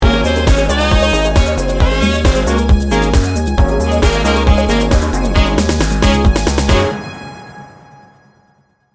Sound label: fashion technology sting bumper